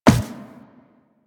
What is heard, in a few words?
Walk Metal, Footsteps; Warframe; Operator